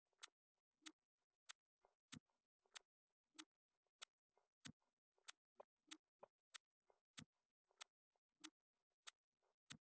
wdrums inst sim

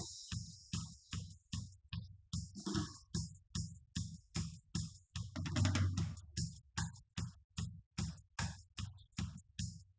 wdrums liverec; SiSEC08; SiSEC underdetermined

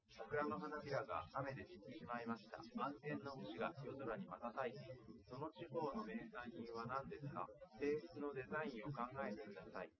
male liverec 250ms 5cm sim; SiSEC08; SiSEC underdetermined

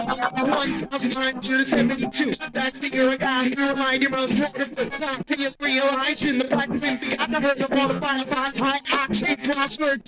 voice

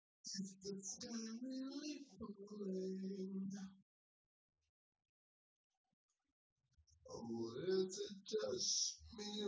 nodrums synthconv; SiSEC08; SiSEC underdetermined

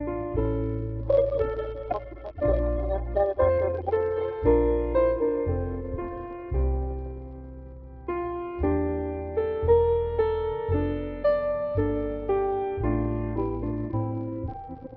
mus

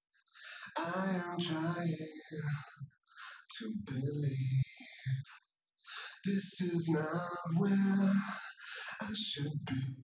nodrums; SiSEC10; underdetermined

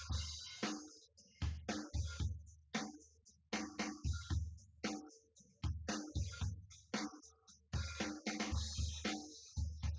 wdrums synthconv; SiSEC08; SiSEC underdetermined

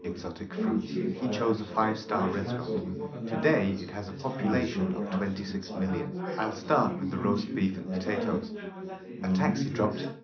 male, 380ms 4cm; SiSEC11; underdetermined

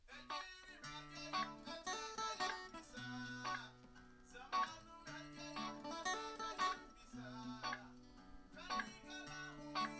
nodrums liverec

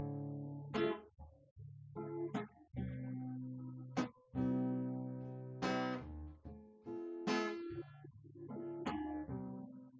nodrums; SiSEC10; underdetermined

